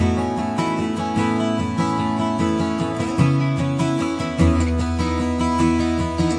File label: 150 gorgeous rhythm guitar song